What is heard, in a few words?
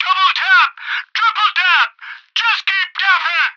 perk double tap